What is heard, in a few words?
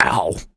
dude ow; Postal Dude Voice Lines